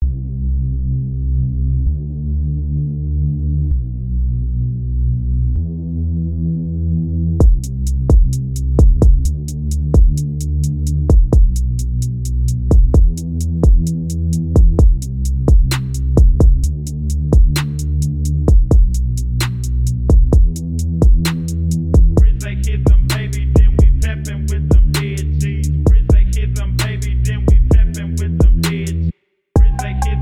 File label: PHONK